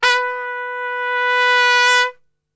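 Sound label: B 2 trumpet; Best Service; Brass Super Section; TRP SWL; 90 s Sample CDs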